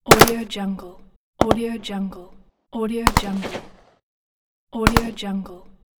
m4 double shot

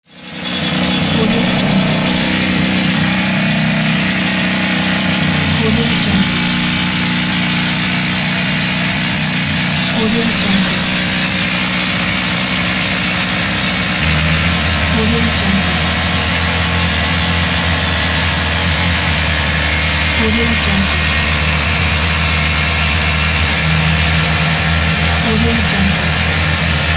mine ambience drilling for ore

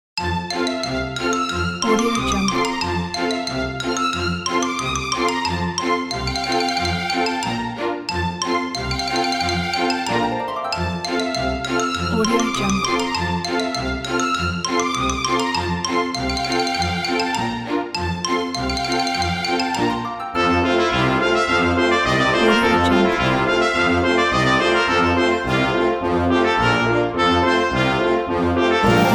russian dance

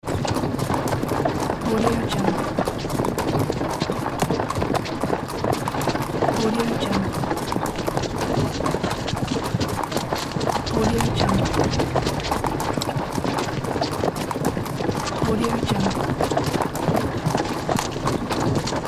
horse carriage wagon

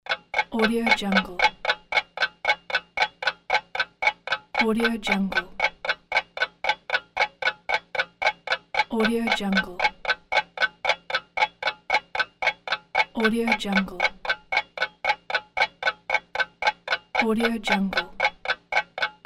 clock ticking

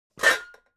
object kitchen pots throw soft

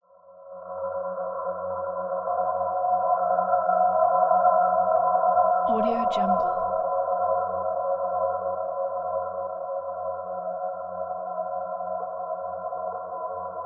futuristic atmosphere

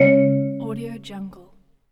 interface button tone

